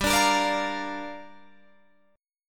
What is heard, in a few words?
G Minor 7th, Guitar, Drop D